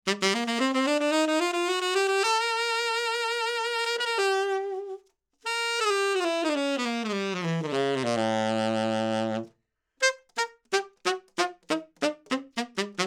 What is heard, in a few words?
Sax Tenor 50cm C Mid